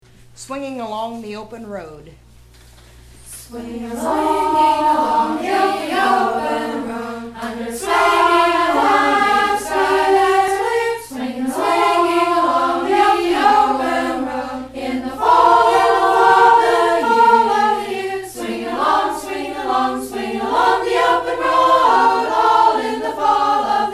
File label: Swinging